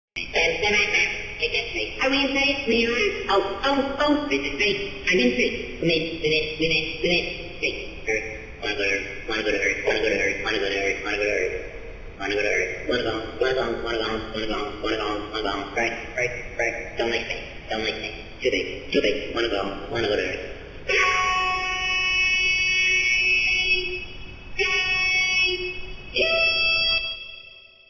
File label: Signal Spaace Audio